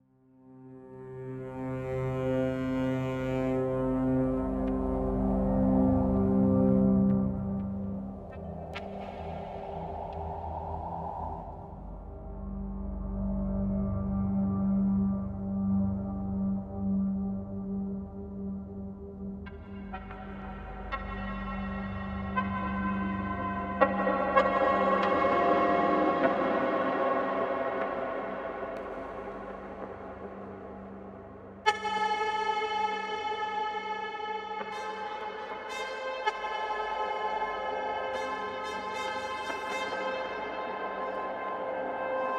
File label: Title Start jingle